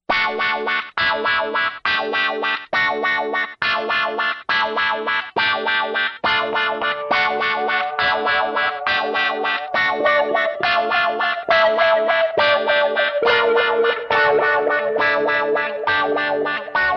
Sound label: clean